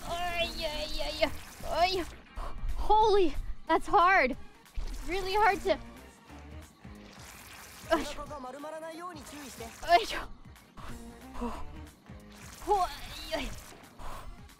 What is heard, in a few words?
noises